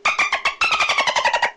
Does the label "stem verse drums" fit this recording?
No